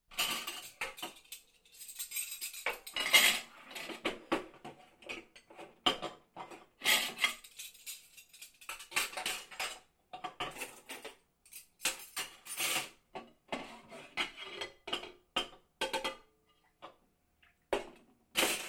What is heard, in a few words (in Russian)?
Sostavlyajut posudu